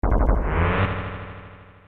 grow; ff3; ff3us

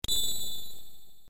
save ting; ff3; ff3us

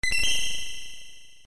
save ting echo; ff3us

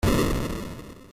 big close; ff3; ff3us

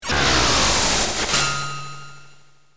mech dispatch; ff3; ff3us

